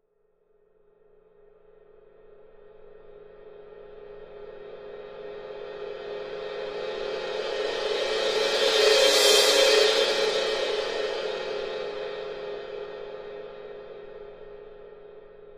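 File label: Cymbal Large Crescendo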